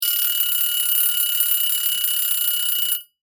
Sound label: Egg Timer Bell Ring